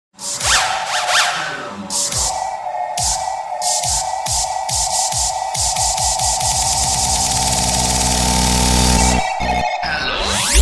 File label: motorola moto remix